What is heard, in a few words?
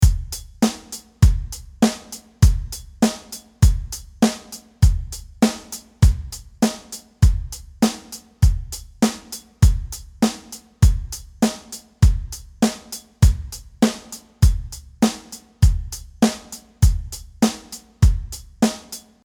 Simple Drums